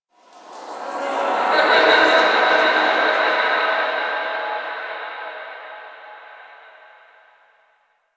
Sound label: Memory Echoes